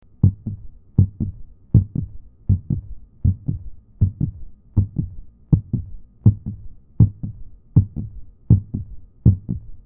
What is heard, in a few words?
Hearbeat